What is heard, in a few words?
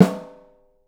OHSTSN L, 3D Drums